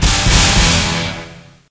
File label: LIFT GOG; Full Throttle; ScummVM